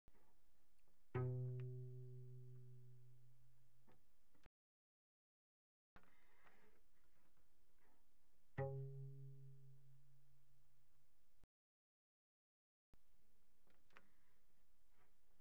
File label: Cello pizz pp sul G B2 C4; Strings